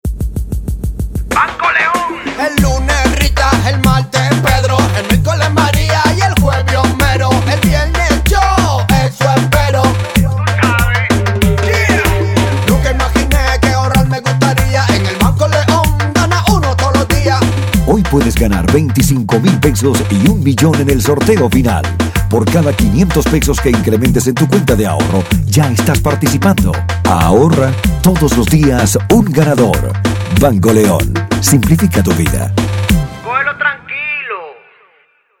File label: reggaeton